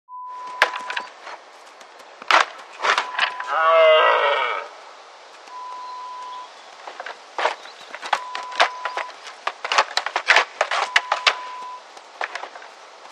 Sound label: Rutting Stags